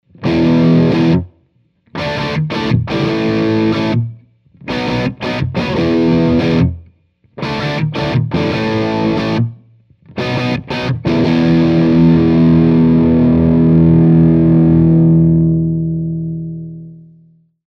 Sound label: scoop mid crunch